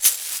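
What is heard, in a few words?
caxixi, drum machines; 300 Drum Machines; E-MU Orbit-3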